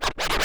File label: Cuts, Vinyl Record Samples